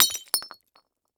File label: Glass Cup Break On Cement; Crashes